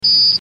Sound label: Top of the hour tone whistle; ABC